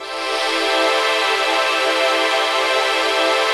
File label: CHRD PAD; Best Service; XX Large Pads; Sample CDs